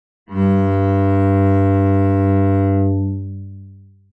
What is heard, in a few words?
A cello tone at approximately 98 Hz (G2); analyzed with a Main Lobe Width of 100 Hz and a Sidelobe Attenuation of 18dB; Windowing In Lemur Analysis